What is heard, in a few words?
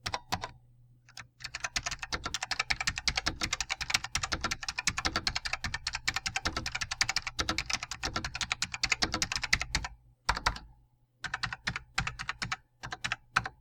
Keyboard recordings Matias Tactile Pro 3